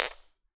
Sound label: Set On Floor, Medium Wine Glass